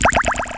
DRIPITY

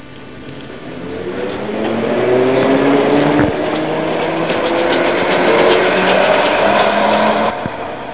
TRAM

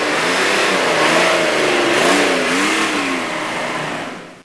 BUS DRIV

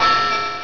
chime

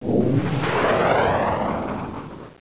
MISSILE; FRAGILE

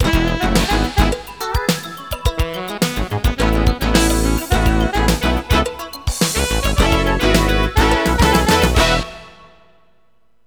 JINGLE

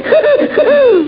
wahoo

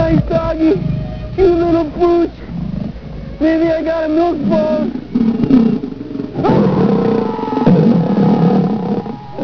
ATK DOG

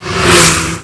DRIVE BY

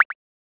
DRIP; KRONDOR

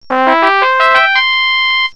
WIN; NETRISFX

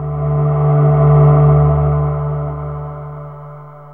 PAD; BEAT2000

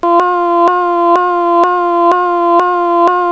AHUAHUA; 999wavs

